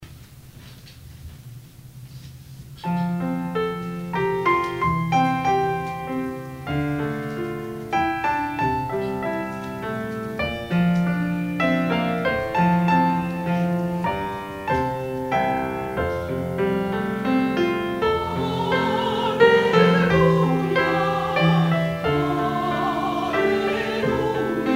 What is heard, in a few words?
Choir; taiwanese